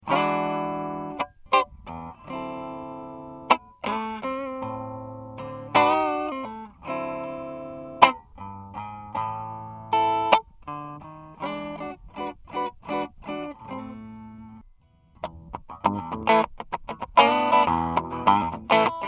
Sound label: tele Hot Rod Clean Mid